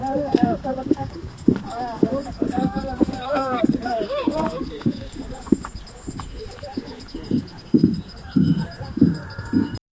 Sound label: background sounds of a, village